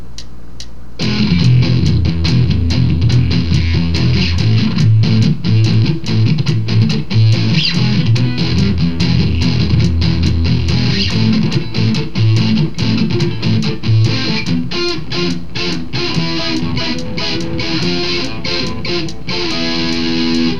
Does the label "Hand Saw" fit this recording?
No